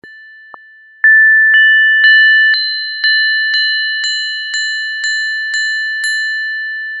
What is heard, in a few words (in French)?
Bois Verre